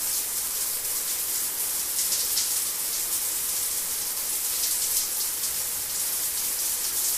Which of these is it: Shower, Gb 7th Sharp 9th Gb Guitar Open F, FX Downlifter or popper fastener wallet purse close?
Shower